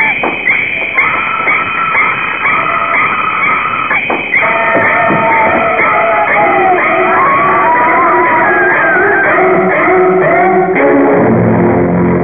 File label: psycho music